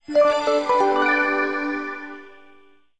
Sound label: Dice Connect